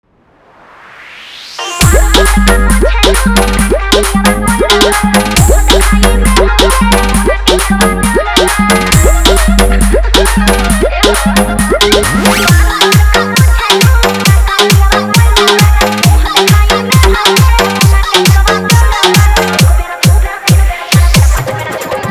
Bhojpuri Remix Song

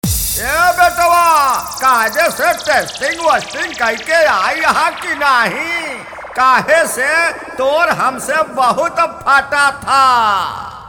Competition Vibration Beat

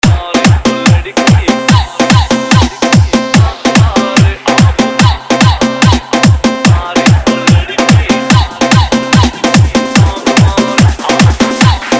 Electro Full Dance Mix

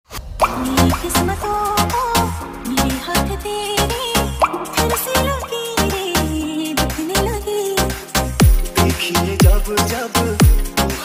Hindi Remix Song

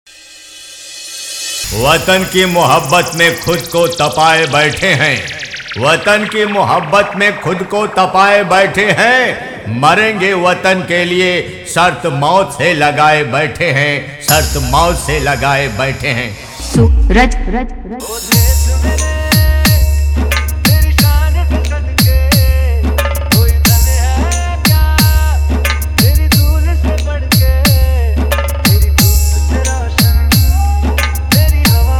Desh Bhakti Remix Dj Song

